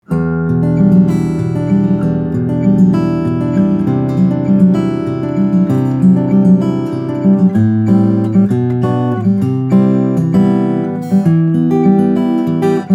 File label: muiderman prototype, steel string guitars flattop